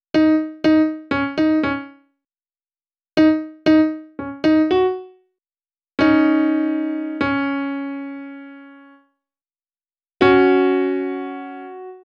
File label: Piano, cinematic funk; Sonic Foundry (Sony Creative Software); Cinematic Funk Urban Tone Poems